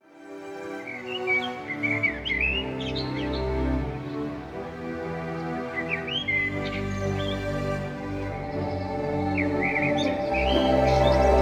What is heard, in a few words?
Rock